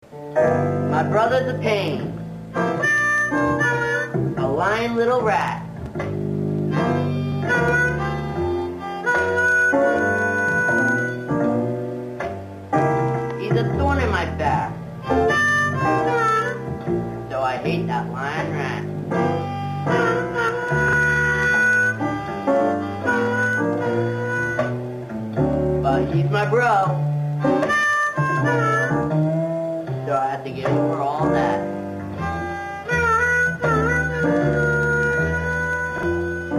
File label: student blues